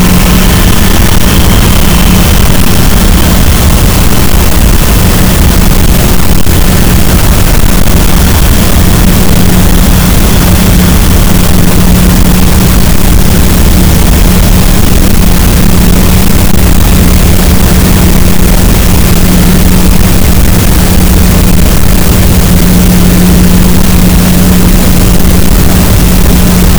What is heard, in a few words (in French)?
cachalot, gaussian noise; Physeter Macrocephalus